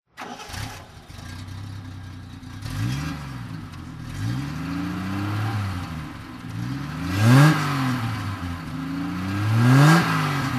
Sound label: triumph tr250 throttle